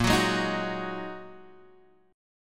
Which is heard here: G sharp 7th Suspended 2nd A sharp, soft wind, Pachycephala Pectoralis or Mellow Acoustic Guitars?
G sharp 7th Suspended 2nd A sharp